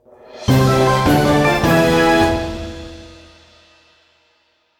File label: Jingle Win; Cookie Run